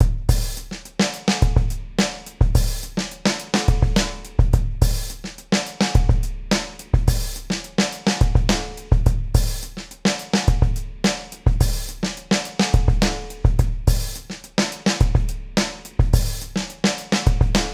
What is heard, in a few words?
Drummers